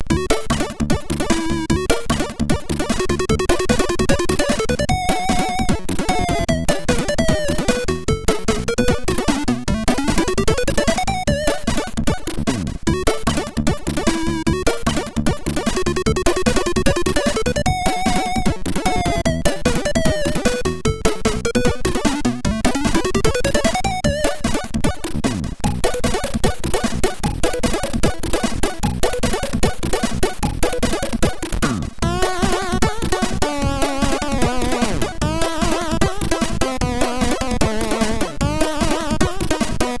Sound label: Astoria 7 tune 2 sid MOS6581R4